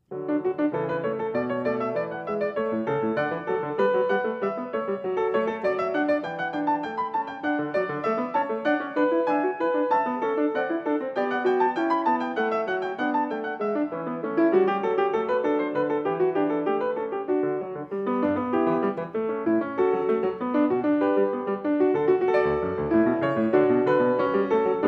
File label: Prelude for keyboard in D major; Bach, Johann Sebastian